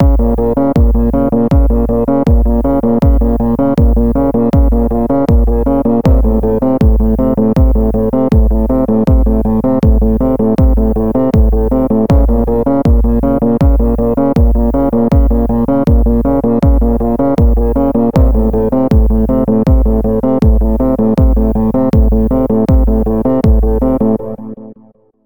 Jazza Music; tallowmere gamerip 2014

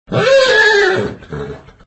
Horse Pain; Extra Lives

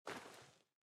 step sand

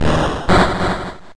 Flame Burst; pokemon reborn e19 2022

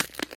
Bones Break; friday the 13th killer puzzle

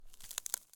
ice; ice age scrats nutty adventure; Level1 Ice Cliffs

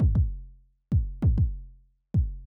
Drum Beat; Get Even

